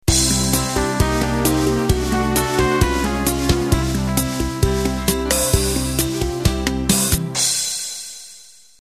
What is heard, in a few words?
Pop Bossa Intro